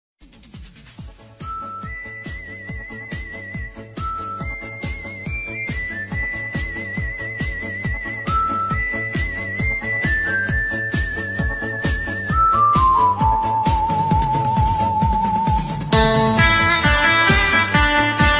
Liên Khúc Nhạc Đỏ Organ; Nhạc Chuông

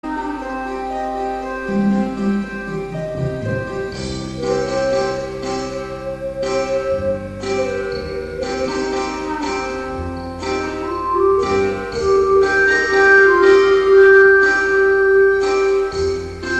Bản Mông Sáo Mèo

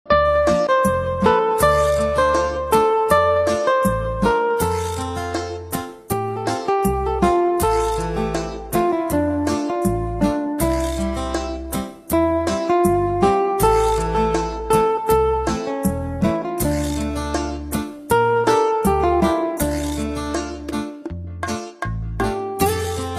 Liên Khúc Nhạc Xẩm Ghita Nhạc Xẩm Bolero

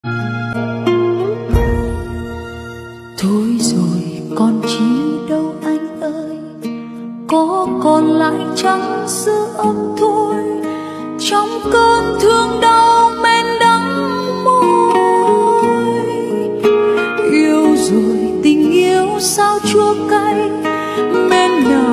Nhạc Vàng Bolero

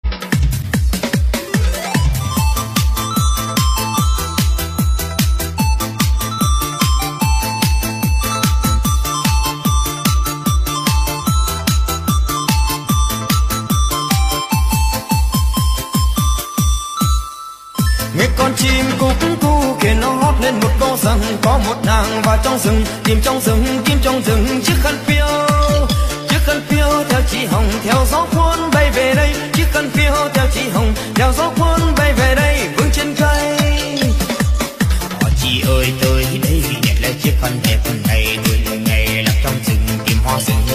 Nhạc Sống DISCO Bolero Remix

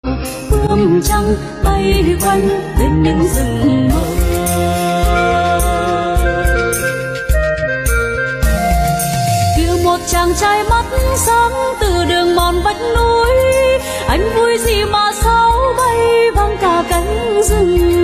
Liên Khúc Nhạc Tây Bắc Liên Khúc Nhạc Núi Rừng Tây Bắc

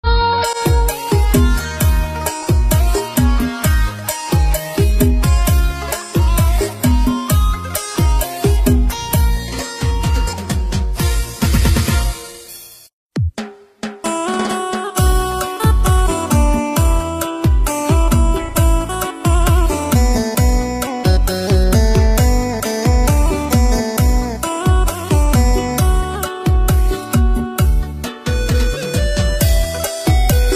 Nhạc Sống Miền Tây LK Cha Cha Cha; Nhạc Chuông

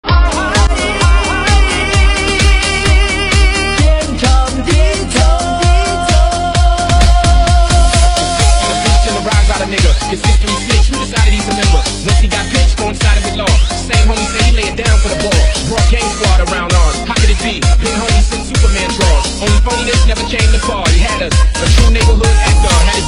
Dj Nonstop China Mix Bass Đập Cực Mạnh và Sôi Động

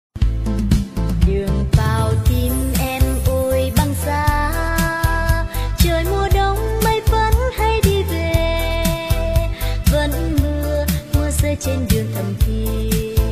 Nhạc Sống Quê Hương

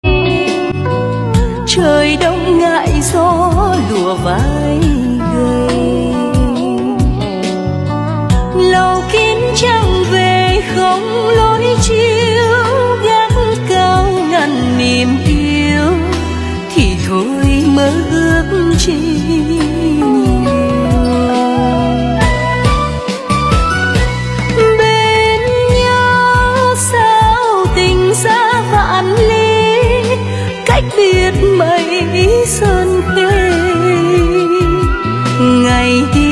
Song Ca Nhạc Sến Trữ Tình

